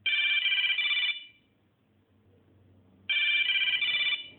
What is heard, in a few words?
nokia 1100 trio ringtone